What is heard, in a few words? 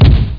CANNON; MOBICLIC